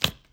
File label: throw down, cards; aliens